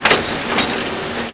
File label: Clank; Fab Boss; The Elder Scrolls III Morrowind GOTY; XBOX